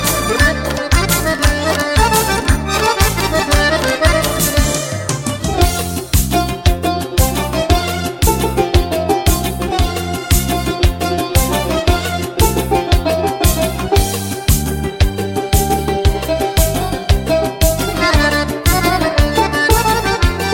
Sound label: Accordion